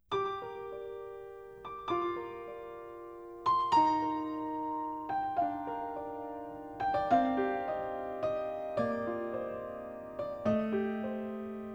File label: Prelude; Jazz